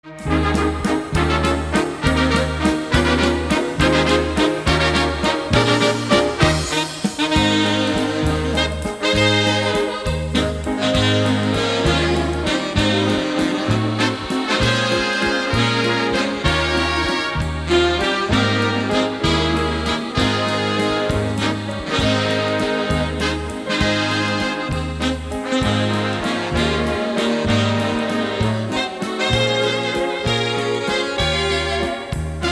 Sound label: Waltz Medley